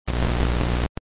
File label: Noise